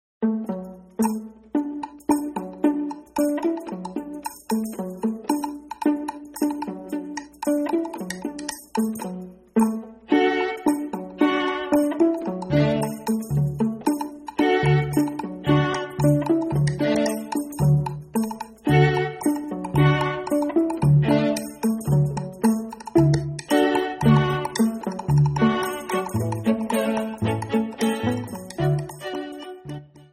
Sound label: stringquartet